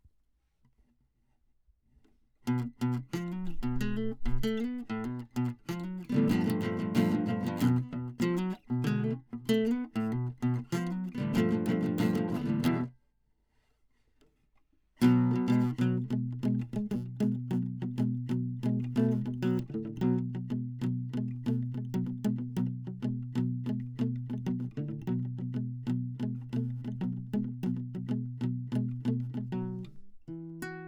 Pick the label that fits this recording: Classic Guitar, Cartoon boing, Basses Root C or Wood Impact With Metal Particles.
Classic Guitar